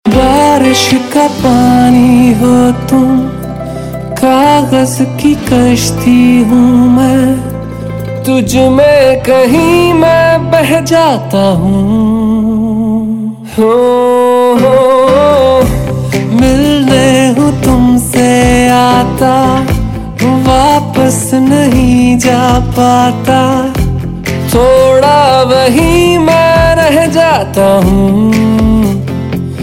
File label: New Romantic Hindi Ringtone; ringtones